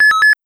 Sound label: Windows 2.5x Startup; Windows Never Released Soundpack 2